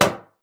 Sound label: pop; mvm; bloon